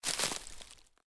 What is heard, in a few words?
Dead Bush; Blind Quest - The Frost Demon